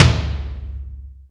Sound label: PWR TOM; Back In Time Records; Big Bang